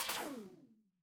retro game laser

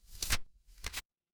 paper fabric page turn

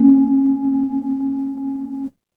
instrument singing bowl

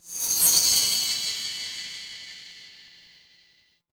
magic scifi spell

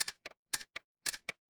ui typing

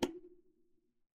cartoon bonk

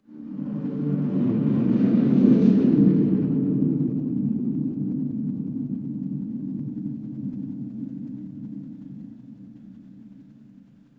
crowd gasp

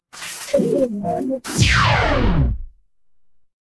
magic scifi laser

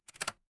door lock

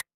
ui hover

